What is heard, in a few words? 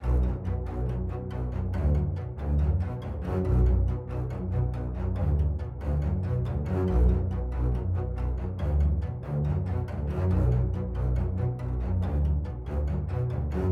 music interactive; World of Tanks